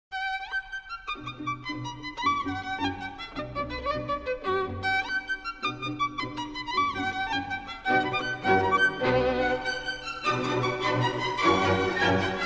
Concerto No. 2 in B Minor for Violin and Orchestra